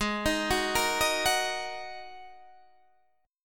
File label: Ab 7th Suspended 2nd, Guitar, Open D